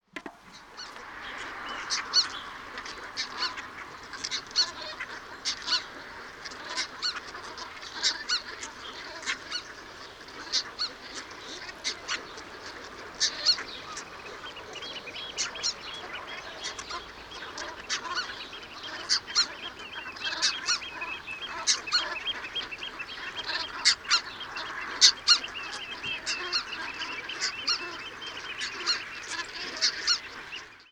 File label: red breasted goose